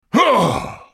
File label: ultimate effort; Galio; Character Voices - English